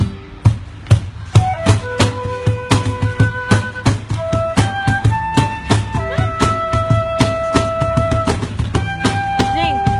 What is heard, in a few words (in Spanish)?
musica triste de meme